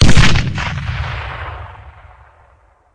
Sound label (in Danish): Eksplosion